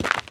Cave Footstep; Devour; The Inn

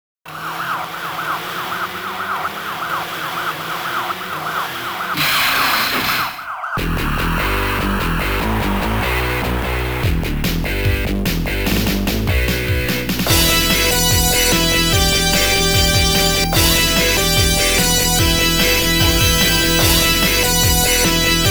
Guitar Rumba; savage reign anthology gamerip; Gordon 2 Ending

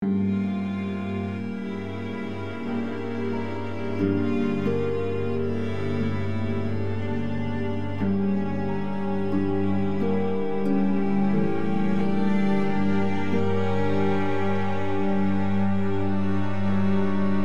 Hopeless Loop

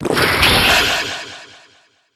Potion drink

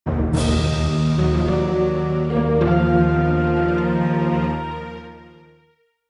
Bad News Jingle; Star Wars Rogue Squadron

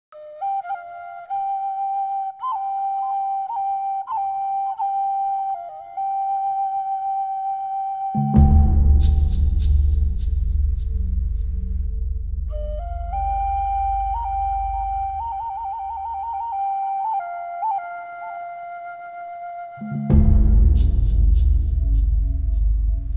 Forest Music; Aspen; Disney's Brother Bear; GBA

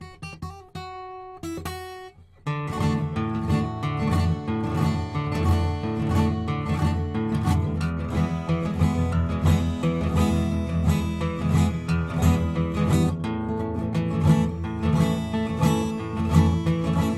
Guitar Music; Assassin's Creed III

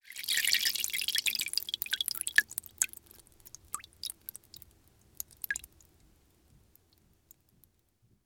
Lamp Oil Fill

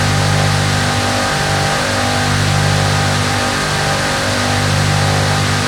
Sound Chiron High; car simulator 2

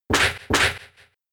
Double Kick

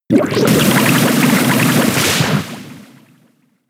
Bubble; pokemon sfx gen 6 attack moves xy oras; Bubble Beam

